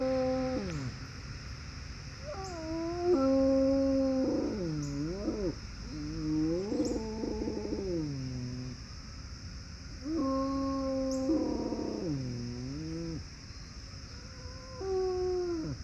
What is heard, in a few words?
Hound siren call